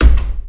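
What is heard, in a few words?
ladder wood, player; quakemapping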